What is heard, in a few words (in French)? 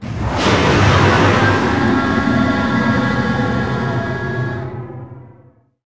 navette, vaisseaux